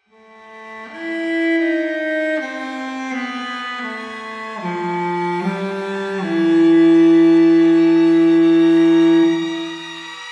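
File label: background music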